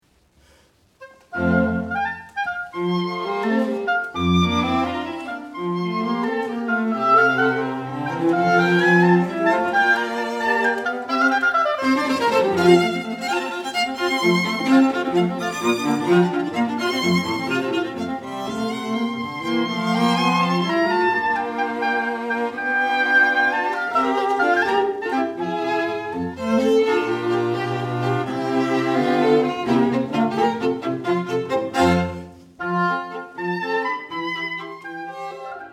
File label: Oboe Quartet; Mozart; 3rd mov